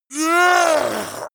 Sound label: yeti grunt pain; kreaturen